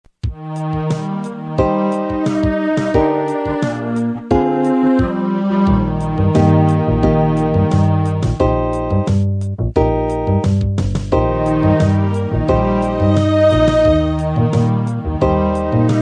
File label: blues